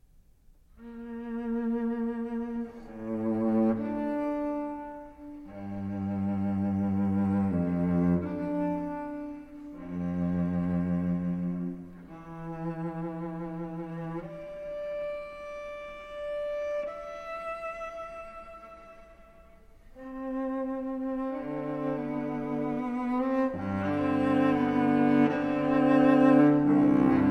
suite for cello

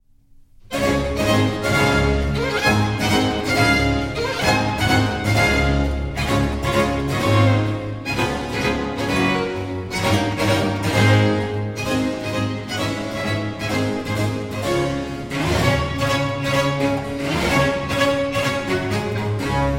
concerto for cello in D major, allegro

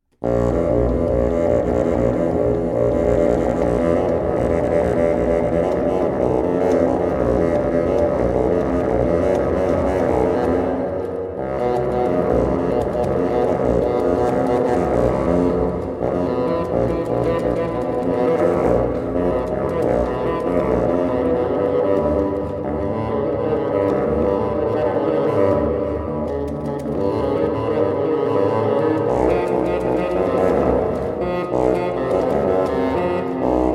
for bassoon and live electronic; concatenation

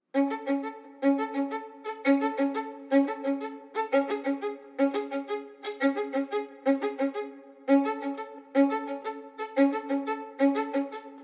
urgent breathless; continuous interior iii; Horizon Line

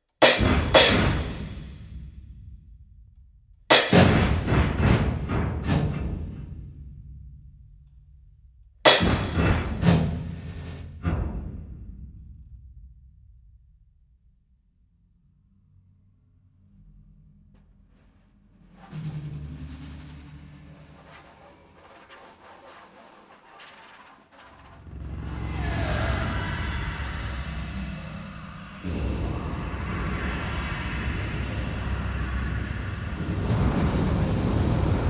snared wired crashed

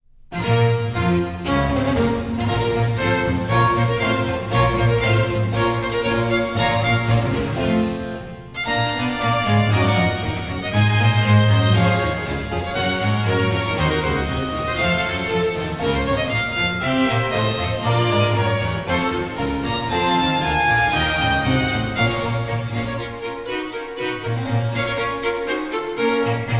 concerto for cello in B minor, allegro

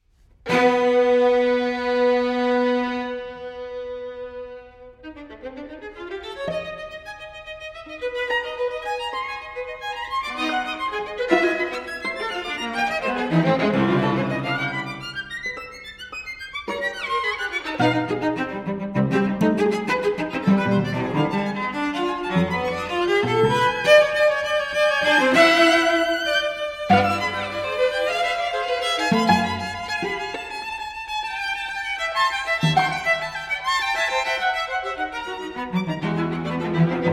string sextet; I. long arching lines, reaching and breathless

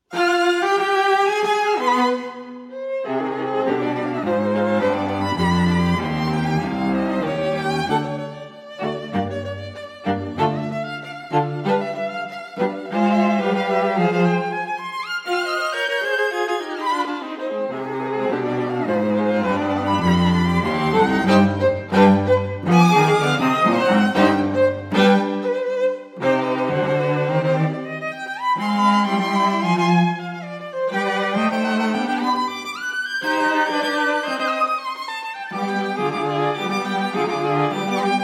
string quartet in c minor allegro

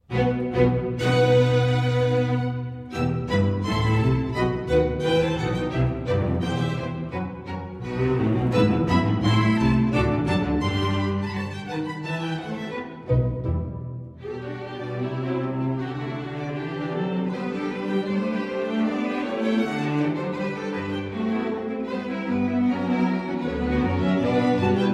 air gavotte for strings gavotte

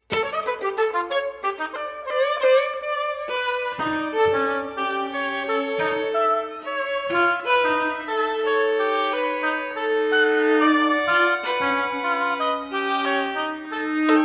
oboe quartet explosive curvy raucous

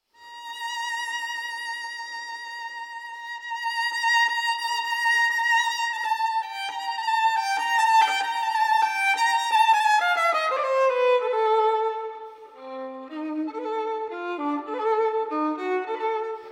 sonata-monologue for violin solo; Armenian; Armenian Music Project

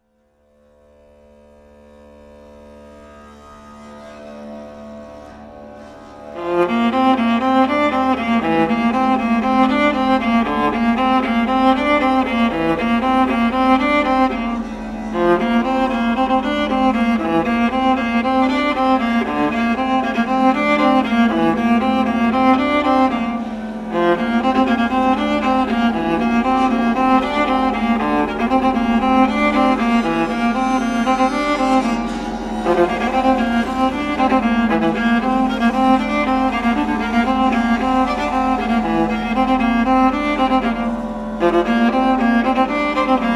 pluck bow blow bow

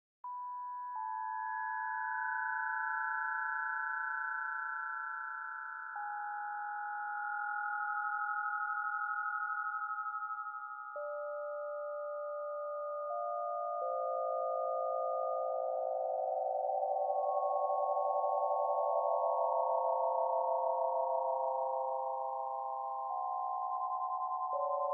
inharmonic fantasy

